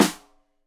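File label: Snare Stereo, Drums; Deeply Sampled Drums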